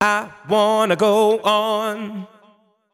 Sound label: deep house male, 125, A, vocals